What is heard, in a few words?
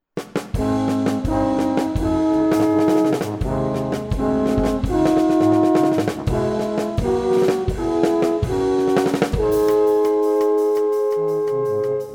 Tuba Trio